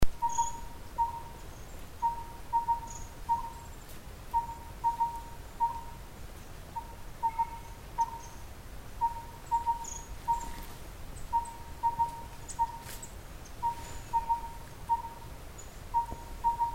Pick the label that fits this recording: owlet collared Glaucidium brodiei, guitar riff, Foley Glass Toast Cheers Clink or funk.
owlet collared Glaucidium brodiei